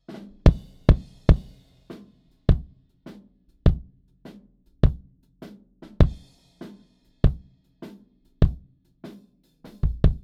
Kick

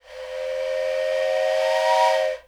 train Whistle; whistles Horns Bells

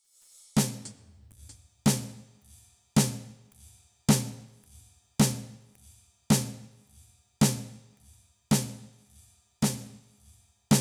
Snare

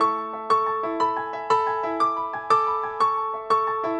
Pop Piano

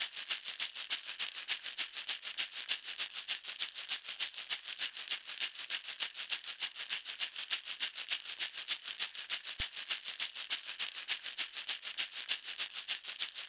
percussion shaker

